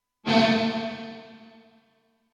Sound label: reverb; リバーブ１ インサート・エフェクト